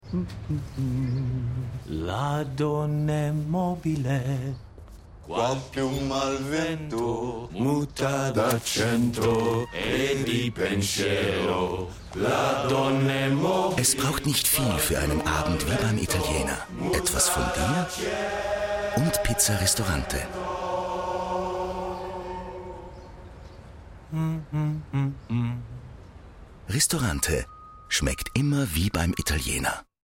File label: ad ristorante chorus